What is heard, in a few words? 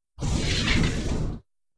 moon landing